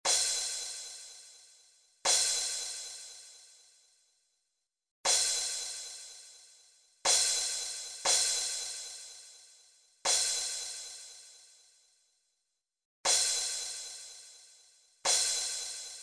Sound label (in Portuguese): Toque - Prato; fanfarra